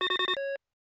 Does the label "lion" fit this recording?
No